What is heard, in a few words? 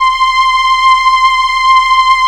SIEL, String Machines; Best Service; XX Large Most Wanted 2; SIEL-CRUI